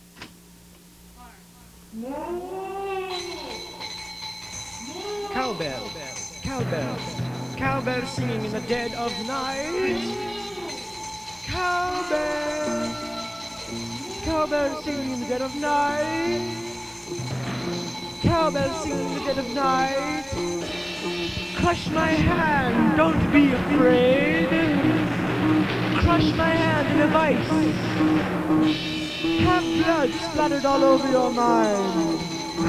Cowbell

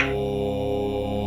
metronome aah mix

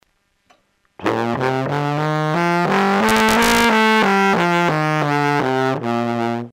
euph scale

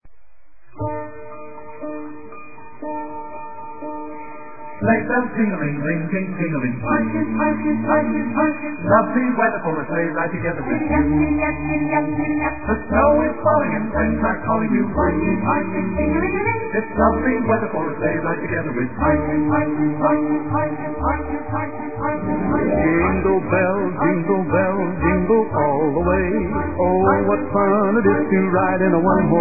Jingle Bells

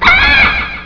tucan

